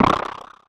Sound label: Experimental Sci Fi